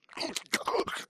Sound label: spectre chest punch idle grunt; Titanfall 2